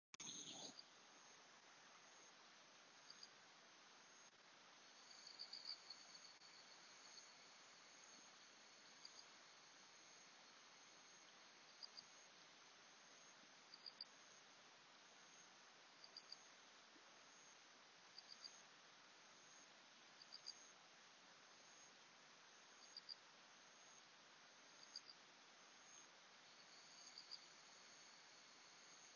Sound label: Cedar forest